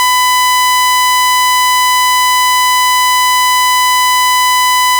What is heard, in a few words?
saw; digistuff